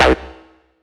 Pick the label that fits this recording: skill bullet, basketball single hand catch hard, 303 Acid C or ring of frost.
303 Acid C